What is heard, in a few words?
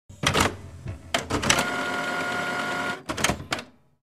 Home office printer preparation to print; scanner and printer